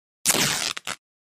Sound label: Fabric Tear Rip